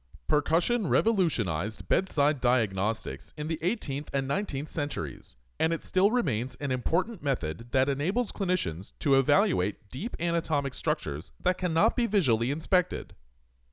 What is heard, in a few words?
Percussion